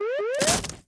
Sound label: crush crate; fruits